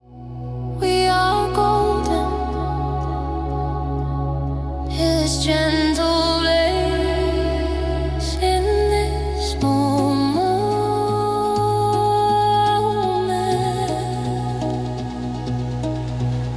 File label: balearic trance; golden hour; sunset